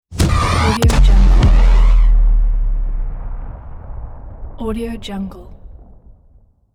hit explosion

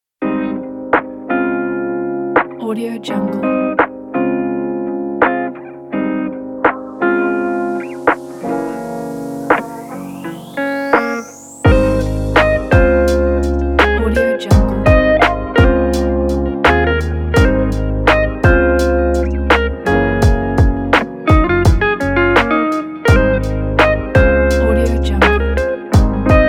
melodic guitar chill hop; smtk-music

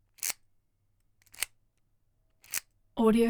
screwdriver mechanical movement metal sliding

